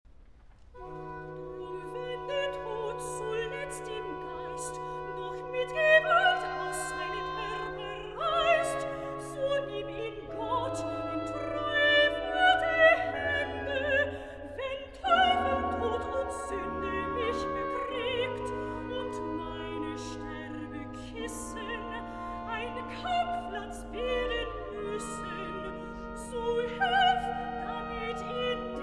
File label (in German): Rezitativ; Bach; Cantatas; Was mein Gott will, das g'scheh allzeit; Drum wenn der Tod zuletzt den Geist